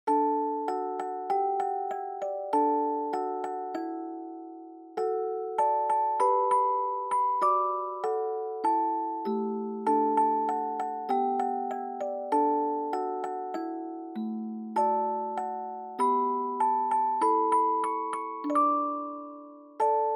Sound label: Dry Fast; bgm